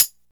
Royality free high-hat tuned to the F note. Loudest frequency: 9073; raw foot hi hat sound f key; hat samples